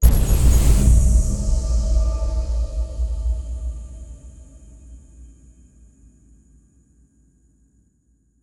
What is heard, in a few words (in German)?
auswahl, menu